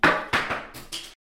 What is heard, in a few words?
wooden